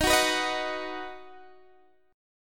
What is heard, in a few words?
Eb Minor Sharp 5th Eb down Guitar DADGAD; strummed